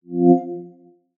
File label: Lock, ui; Amazon Fire